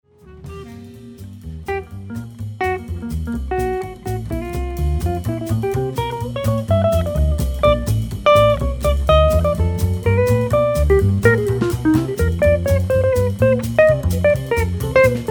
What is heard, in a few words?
Jazz